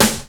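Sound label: snare, drum kits; InVision Interactive; 40 Oz Phat Slammin Hip Hop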